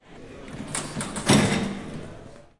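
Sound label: turnstile sounds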